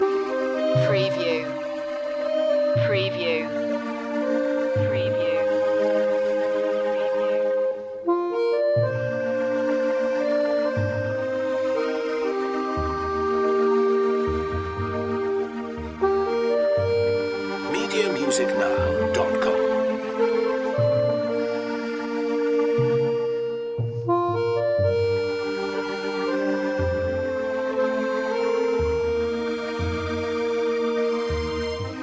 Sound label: mist accordeon loop